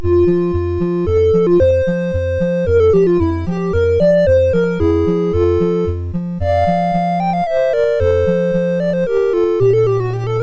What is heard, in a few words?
Sonatina in F Major, MOS 6581; MOS6581R4